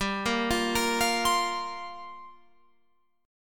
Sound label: G Minor 11th G down Guitar Open F; Gm11 arpeggiated